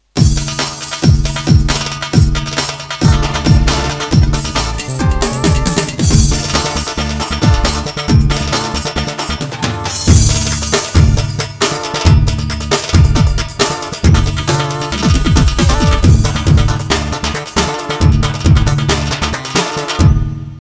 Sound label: 9/8 standart, roland gw 8 ritim